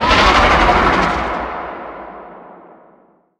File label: creature ice worm vo disappear